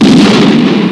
Shotgun; Bunny Killer III

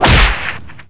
whip crack; Gamestar

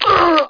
land hurt; Daikatana

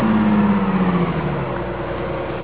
BUS STOP; Más de 2,500 Juegos

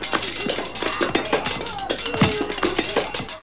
SWORDS

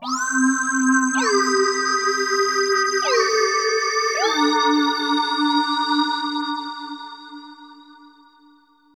synth pad ambient 160 C; Sphere

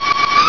hi jump

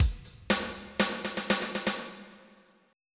fill; MacAddict; Voodoo 1.2.0 Demo

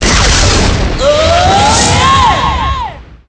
speedup

